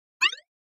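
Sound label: Flash Beep Electronic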